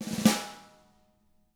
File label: snare roll